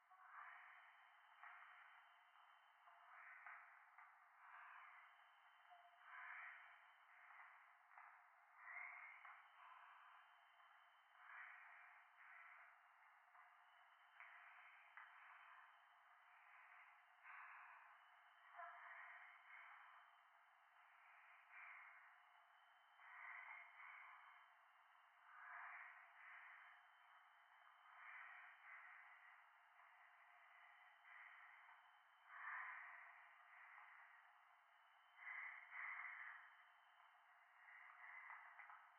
教会 右耳密着吐息 山奥

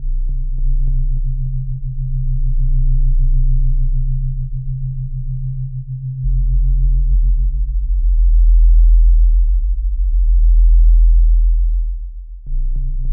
BGM「30Hz40Hz差音10Hzガイドあり」; 性愛媚薬

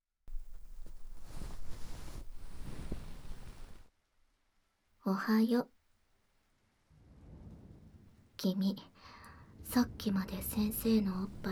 雨音とぐっすり耳かき&ささやき

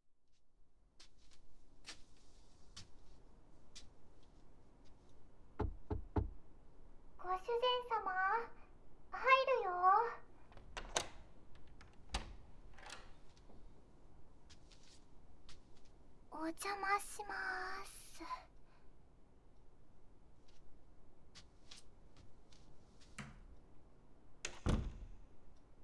耳かき・吐息・囁き・お耳ふーっ

